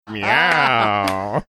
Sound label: meow